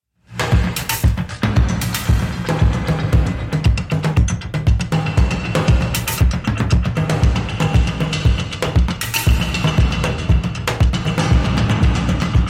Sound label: drum version